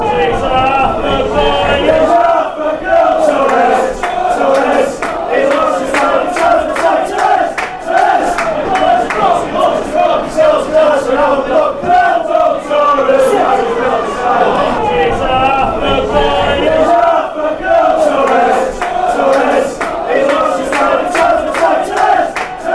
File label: half a boy half a girl fanchants; torres